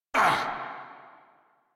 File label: Pain, Grineer Male